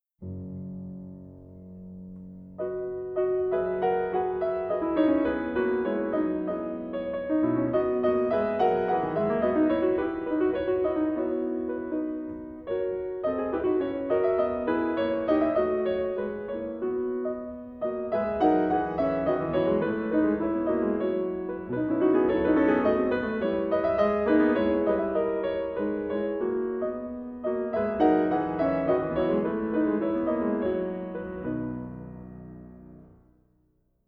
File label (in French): piano hymne; himno europeo; 53 años del himno europeo, un símbolo de unidad